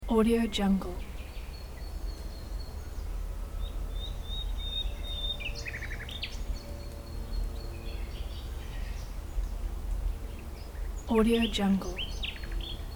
forest birds insects daytime airplane noon; خواندن پرندگان در جنگ; nature sounds

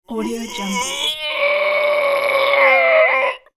strongman heavy pushing yell; فشار جسم سنگین

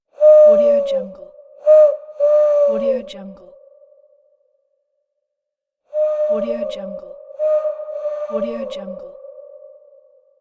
cartoon scary owl hoot; جغد ترسناک کارتونی

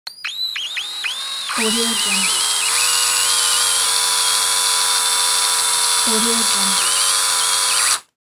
drill; urban sounds

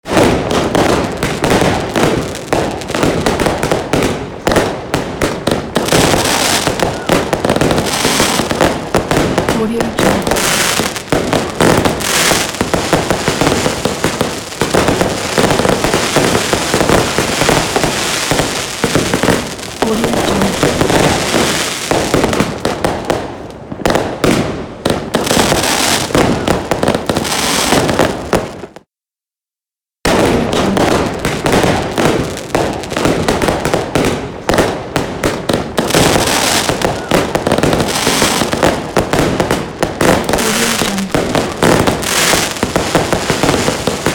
fireworks; انفجار ترقه

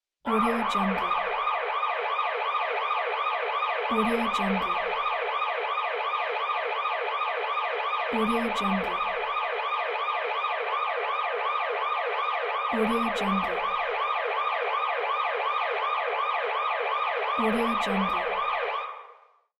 siren police; urban sounds

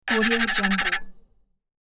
foley scratch metal friction fast; domestic sounds

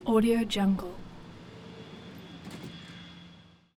airport electric door noisey airport terminal; urban sounds; درب برقی فرودگاه

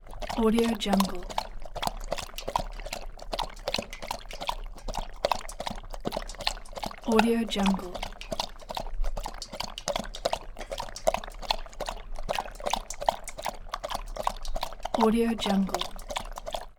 english sheepdog lapping water

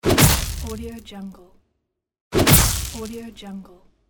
electric kick; game

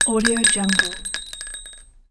rounds metal drop onto smooth tile floor; game sounds; افتادن قطعه فلزی رو کاشی

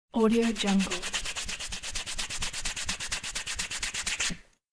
pumice scrubbing cleaning; domestic sounds

